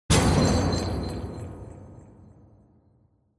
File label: Impact Low; Onoma